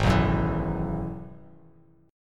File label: G sharp Minor 7th Sharp 5th G sharp down Bass Standard; G#m7#5 strummed; JGuitar